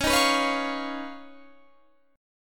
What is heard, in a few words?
D sharp 7th Suspended 2nd Sharp 5th